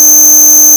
phase distortion constant parameter

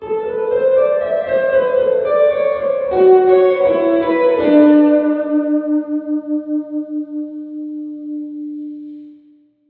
guitar reference reverb

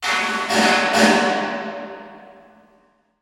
drum reference reverb

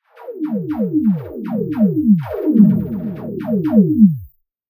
snare

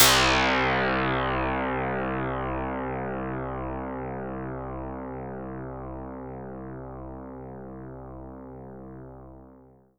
string C chain Outside

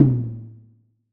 low tom

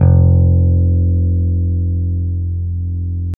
ebass dry

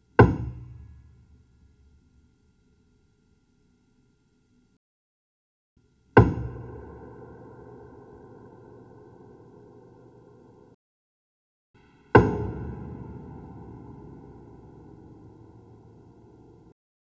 Key, piano pedal

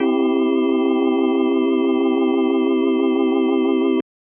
c major chord leslie fast, hammond

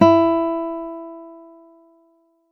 synth sound

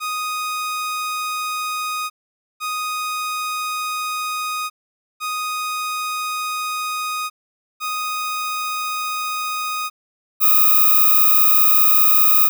sequence f1245Hz

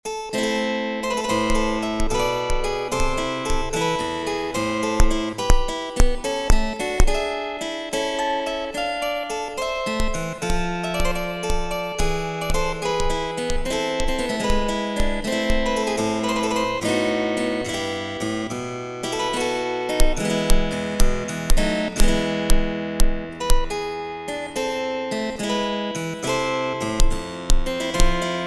harp thumps; antiquing

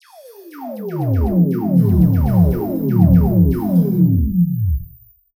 drummix

